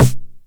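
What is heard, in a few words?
909 SD Tape, snare drum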